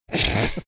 snorting

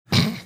snorting

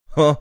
"huh"

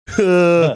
huhhhhhhh

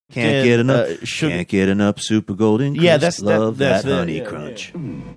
singing jingle; super golden crisp

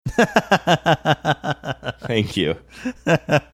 laughing saying thank you

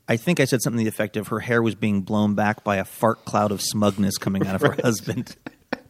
hair blown back fart cloud

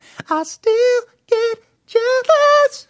singing jealous

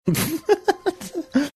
snickering laughing

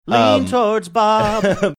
singing lean towards

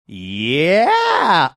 poorly imitating scream; walshelm scream

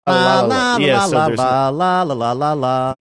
singing theme song; smurfs

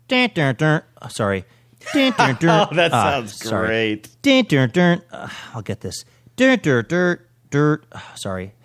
recreating sound struggling guitar

